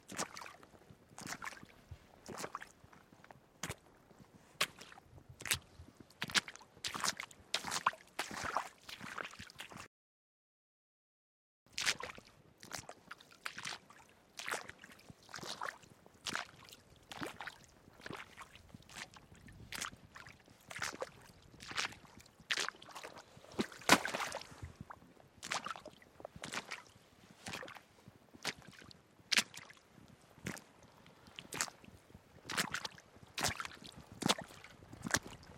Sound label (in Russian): zvuki shagov cheloveka po vode